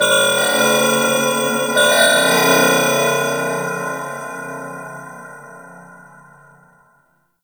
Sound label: Temple Moving Bells; Ambience; Corpus Ship; Temple One